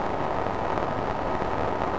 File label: Bomb rockets; Megaplane